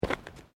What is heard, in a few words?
Footsteps Boots Ceramic Paving Tiles Step; Footstep Foley; Human